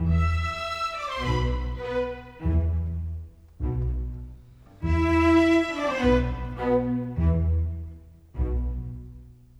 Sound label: Strings; Sonic Foundry (Sony Creative Software); Orchestral VOL-2; Modern 02